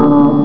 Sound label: DOOT